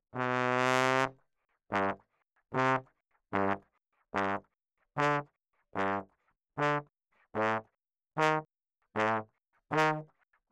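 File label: RAGTIME trombone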